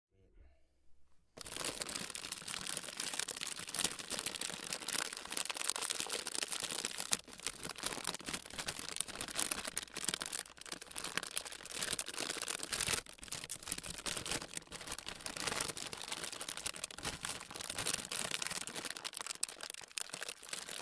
Pack of chips

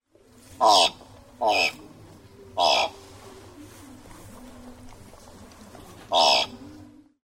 Thick billed raven

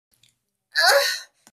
moan voice

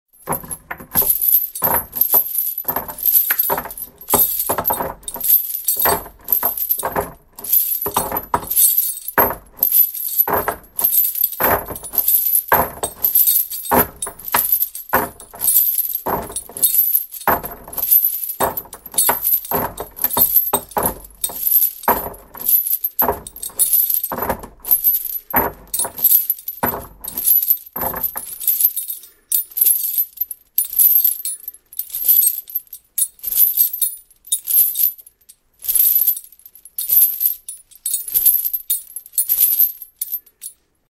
shackles; غل و زنجیر

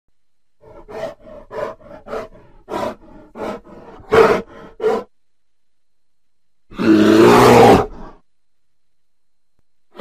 Grizzly bear sounds

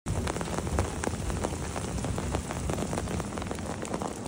The Satisfying Sound from a Hail

